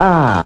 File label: "ah"; dr. oct